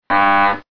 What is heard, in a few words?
honk